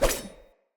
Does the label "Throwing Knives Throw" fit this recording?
Yes